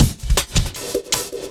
Beat, Drums

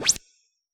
Reverse Pulse & Noise; Yamaha DJX; DJX Kit

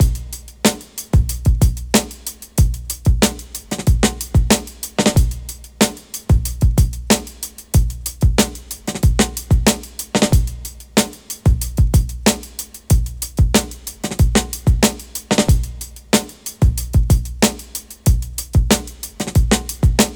93 breaks; Tektite; Lockdown Breaks

